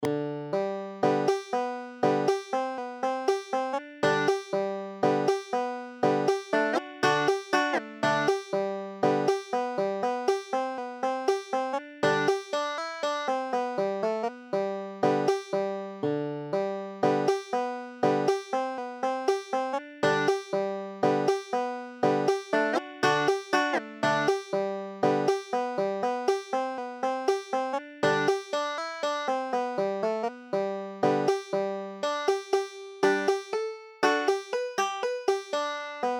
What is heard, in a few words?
banjo